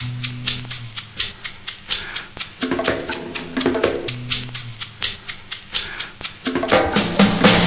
125 bpm, jungle record intro, drum loops and breaks